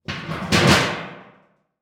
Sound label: Foley Metal Pound